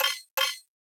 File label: Comp Beep; Ambience; Warframe; Tenno Hub